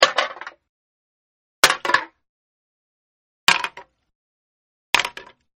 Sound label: Empty soda can drop, Sound Of Empty Sodacan Being Drop On The Ground; 4 Takes; Metal; Metal (Sound Effects:Materials)